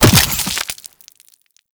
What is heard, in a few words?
Infested Uzi Cluster Explosion; Warframe